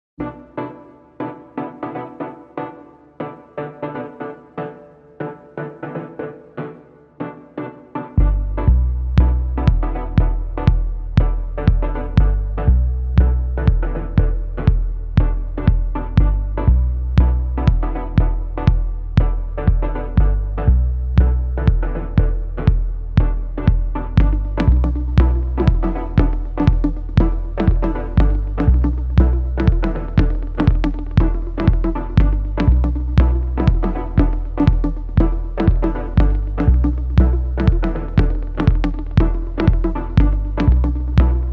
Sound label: Bed